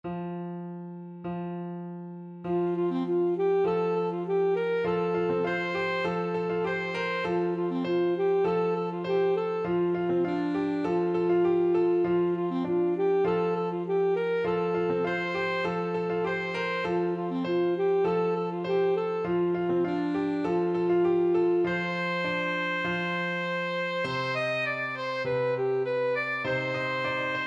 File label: mi y malel, saxophone